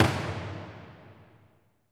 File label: Mahler Hammer; percussion; Cymatics - Infinity Beta Pack 2.0; Zeus Percussion Collection